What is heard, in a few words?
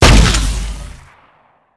railgun fire; Shattered Horizon